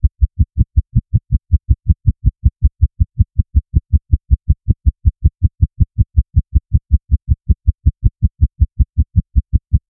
fast heartbeat

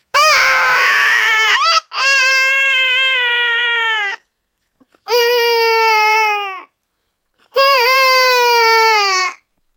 puppy crying

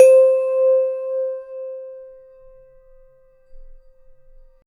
a clean dry audio recordi, single note

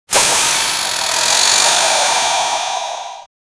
dash sound speed dash; motion

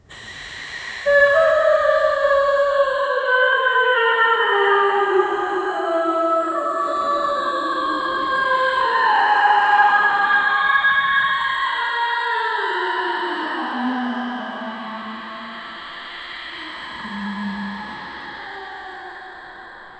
terrifying female ghost

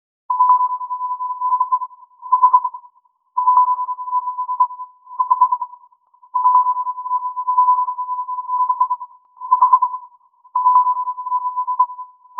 submarine sonar; warfare

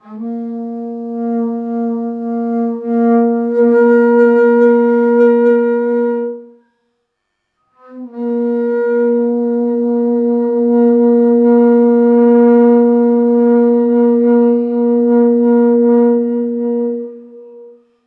a series of deep guttural, brass instrument

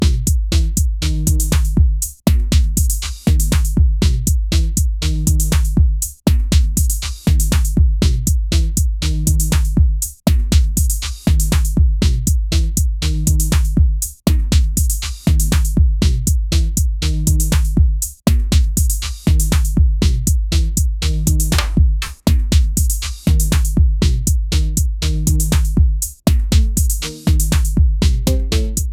lo-fi with drum; music

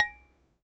kitchen

